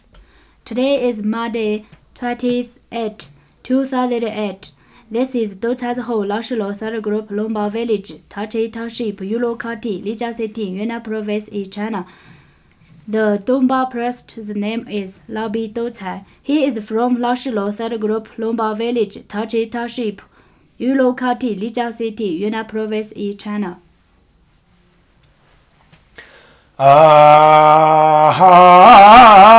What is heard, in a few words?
Yulong Chanting